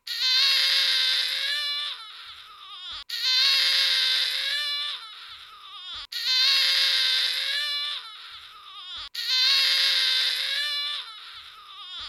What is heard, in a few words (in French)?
pleur douleur vaccin; séances de vaccination; Il devient chaotique, rêche et grinçant.